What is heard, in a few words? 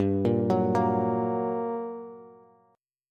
G Minor Major 7th, down, Bass; GmM7 arpeggiated; JGuitar